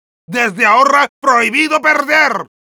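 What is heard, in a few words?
jeers, Spanish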